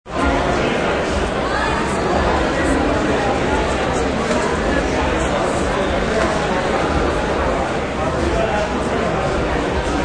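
Subway Underground Crowd, Crowds in a subway station; Train Stations (Sound Effects:Backgrounds)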